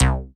SNGL.BASS, Jungle Rave

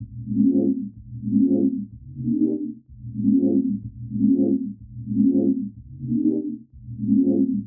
Chd Loop 125, Loungin House; Music-Fx Loops 125; Equipped Music

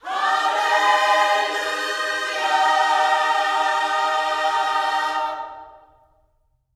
HALLELU, Gospel

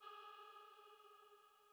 Female Pad G#3

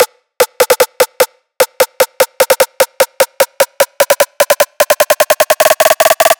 150BPM Long Snare Roll; Antidote Advent; Infected Track Drums Ableton Project

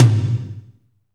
TOM, Fast Rock Kit Dry; Northstar; Drumscapes Roland

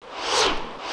SWISH; E-MU Formula 4000 Series Vol. 2; Dance Set 2

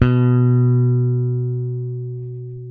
ROCK B 3, Bass, ROCKER; Spectrasonics; Bass Legends

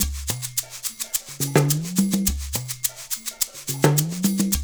loop, percussion; InVision Interactive; Percussion Slam; ZOMBIE

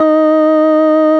FARF, FARFISA

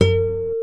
B NYLON, Guitars

